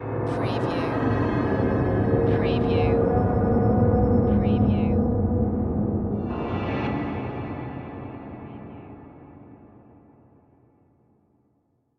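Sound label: Production Element Stab; Sound Effects Bible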